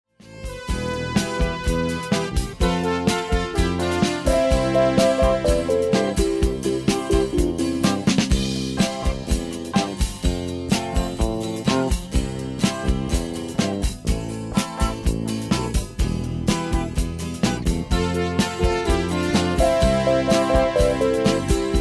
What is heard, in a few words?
strum; Teppei